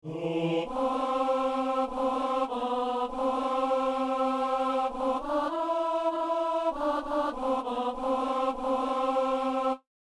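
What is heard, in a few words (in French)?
psaume, ténor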